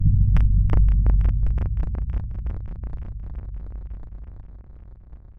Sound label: Silent Noise